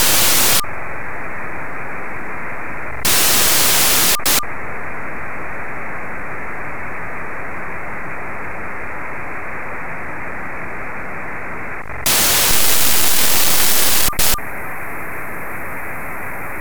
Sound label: P25 Audio